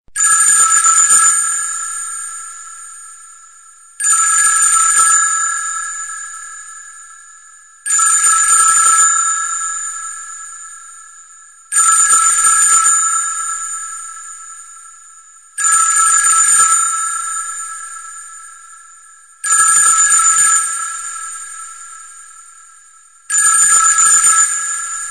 old phone

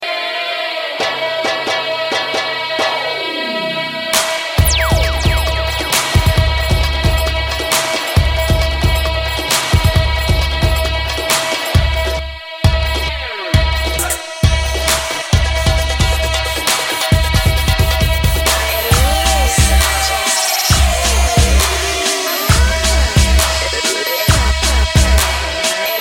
hip hop instrumental

